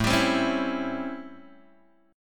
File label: A 7th Sharp 9th A down Guitar Open G